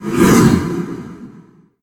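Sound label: attack vox; gundyr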